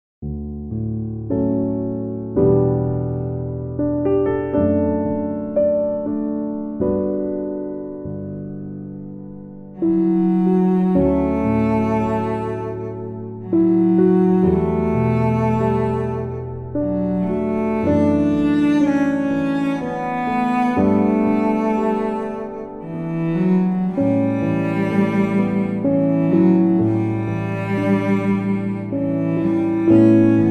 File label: Lullaby For Cello And Piano; Brahms